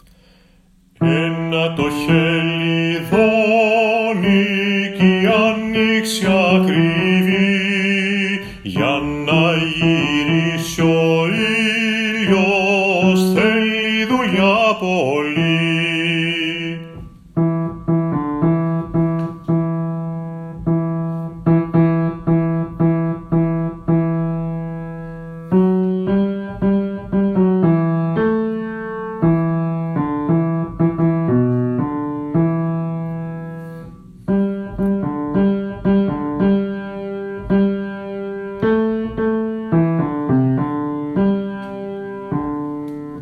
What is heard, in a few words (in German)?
Bass Klavier